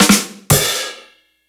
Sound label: Rimshot; Streets of Rogue